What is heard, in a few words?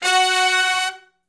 Hi Medium Short Note (F#) uni, Horncraft for R&B; Sonic Foundry (Sony Creative Software); Sections